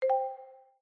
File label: reel hold on, Fruit Pot sounds